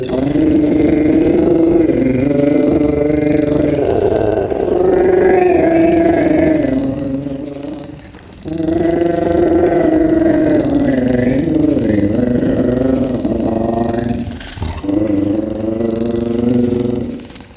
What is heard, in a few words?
Bell Beast Sing